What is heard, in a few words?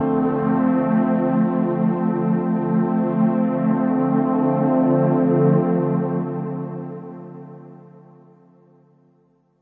warbling seventh, Pads